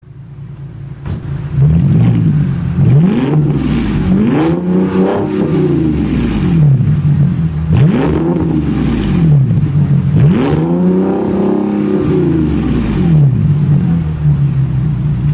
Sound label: Volkswagen Touareg